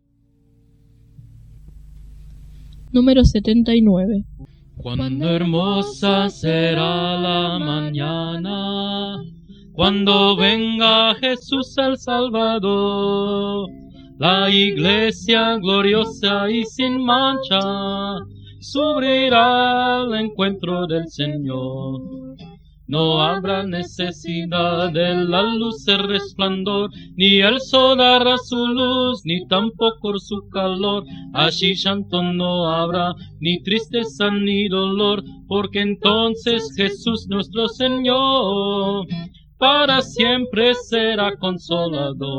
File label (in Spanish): Coro, himnario niños